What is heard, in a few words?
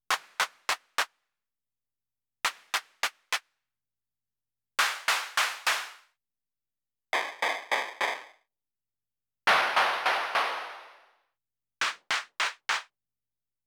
Fire Instruments CLAP